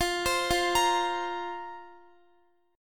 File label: F Suspended 4th F, Guitar; Fsus4; arpeggiated; x,x,15,17,17,17